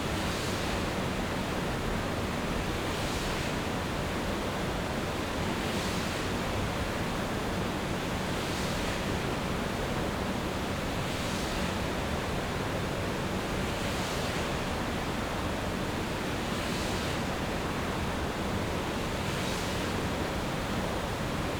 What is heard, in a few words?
Piping Systems Sewage Plant Indoor Hall Water Flow Slow Rotating Pumps Constant; ShapingWaves